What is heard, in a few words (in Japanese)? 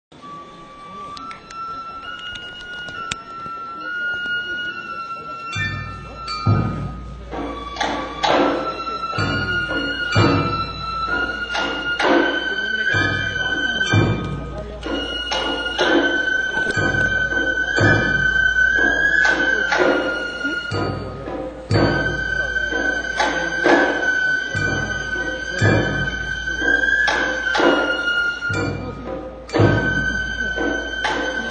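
人形囃子, からくり人形の演技の際に演奏される; 旧からくり人形（乱杭渡り）の演技の際に演奏される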